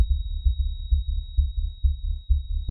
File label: Player Kill; MiSide